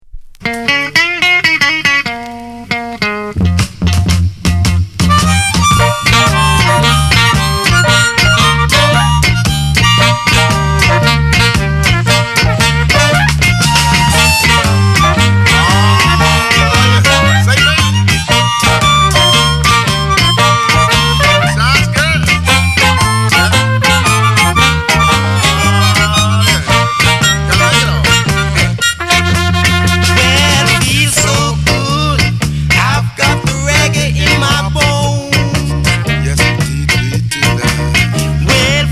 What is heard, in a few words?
REGGAE SHUFFLE